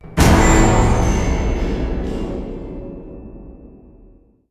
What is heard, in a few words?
Accent Beat ~ Action Hit; Cabela's Dangerous Hunts 2013; PS3; Wii; Xbox 360